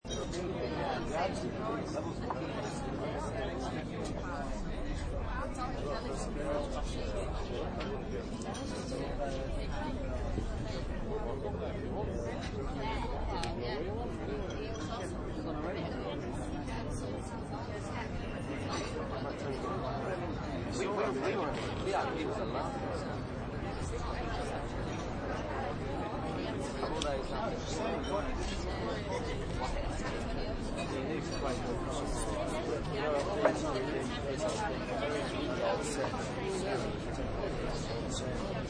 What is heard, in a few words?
Bar or pub ambient sound. Outdoor bar on pedestrian street.; People chatting, light drinking and conversation; Outdoors; Lynne Music